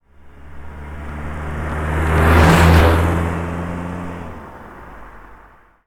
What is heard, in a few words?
car passing by